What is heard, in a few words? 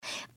breath; pony; Twilight Sparkle